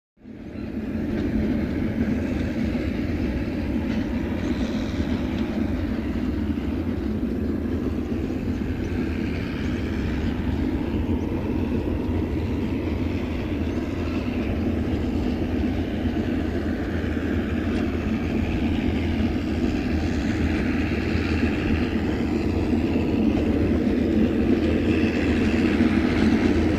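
By Diesel Train Up From, Continental Diesel Train Past With Klaxon Horn.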